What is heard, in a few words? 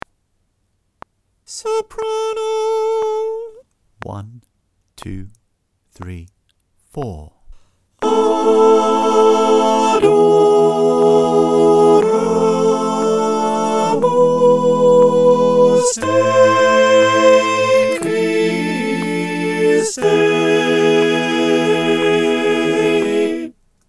sop click; Adoramus Te Part 1